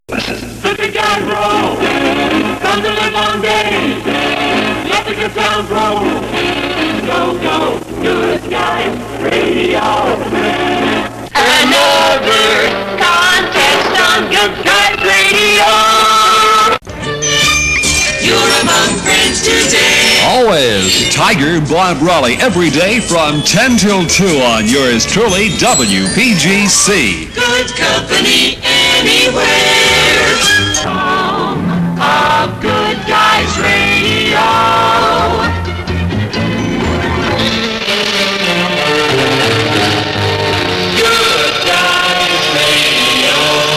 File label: jingles steals; pams series 24